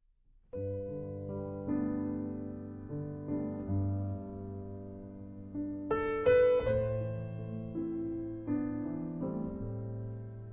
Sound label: Naked Piano; Esposa